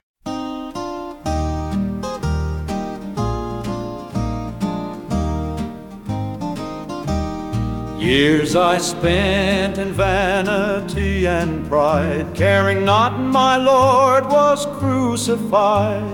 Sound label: Hymn, Male Voice, Gospel; By Calvary